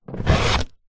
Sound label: musical violin in case pick up from ground; zapsplat